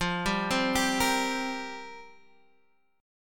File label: F Suspended 2nd Suspended 4th, down, Guitar, Half Step Down